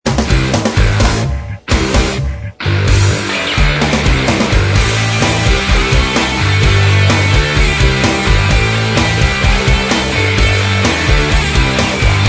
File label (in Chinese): 精力充沛、动力十足的摇滚朋克音乐。 扭曲的吉他与锯和“塞壬”合成器。 独特的吉他和鼓的声音。